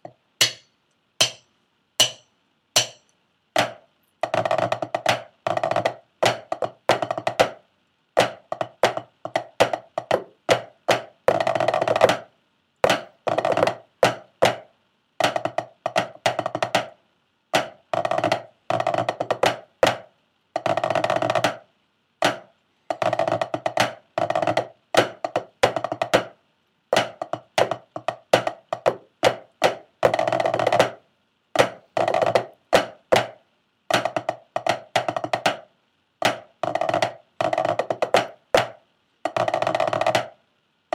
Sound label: snare tenor